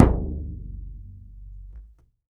BODHRAN, Celtic Percussion; E-MU Producer Series Vol. 7 – Old World Instruments

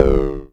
Klone Dual Percussion Synthesiser tom; KLONE FX NW8

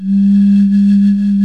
BOTTLES; OMI - Universe of Sounds

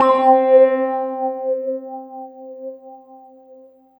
KEYS, Drum & Bass, 160 BPM; Ueberschall; Drum & Bass Flash Trackz

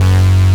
SYNTHESIZER ANA LEAD; AKAI S6000 CD-ROM - Volume 1

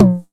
909 NOISY; Wizoo - Platinum 24 Electronic; 909 NOISY P1

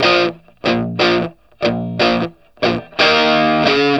Vintage Blues Guitar; USB Soundscan vol.22; AKAI

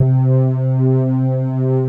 JUP STRING; Pro-Rec - Synthsonic; JV ANALOG2

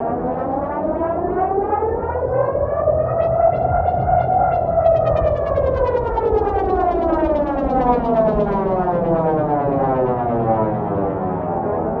Guitar Textures

